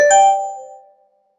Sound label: chime, notifications